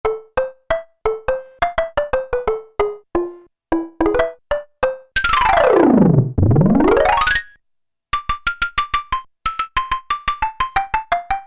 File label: SLDRUM, Ensoniq ESQ-1